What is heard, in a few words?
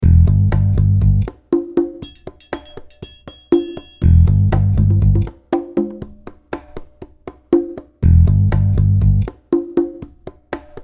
bass, blu